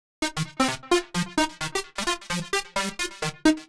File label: 130 C, uk garage, Synths; 130bpm Lines n Loops